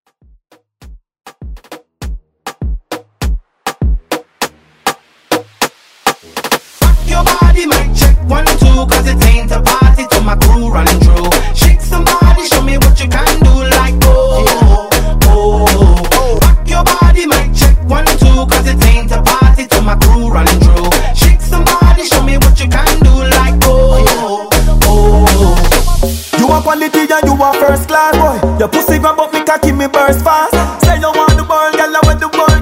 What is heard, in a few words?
Moombah Mixshow